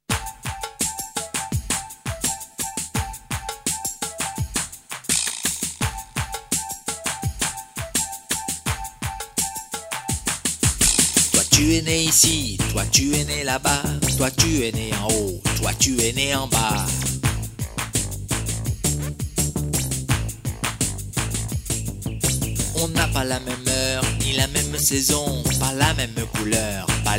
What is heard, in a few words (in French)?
Le rap des contraires